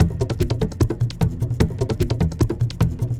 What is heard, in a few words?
ELECTRO ACOUSTIC MELT; Sonic Foundry (Sony Creative Software); Vir Unis Infusion